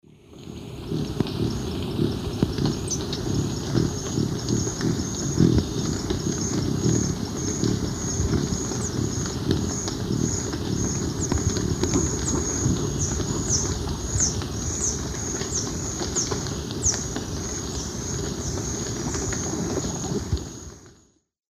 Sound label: Threnetes niger, hum and flight calls; S. America; Eastern Lowlands